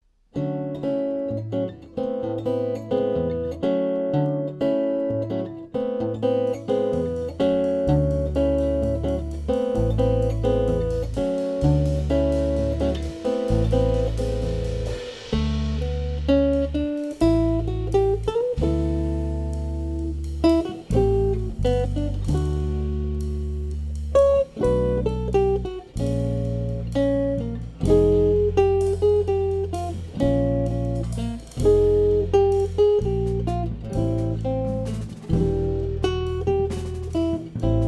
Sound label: quartet, latin ensemble